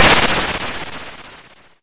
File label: Snare, YM, CHIPSHOP HITS DRUMS; ChipShop Deluxe